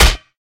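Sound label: hit solid metal can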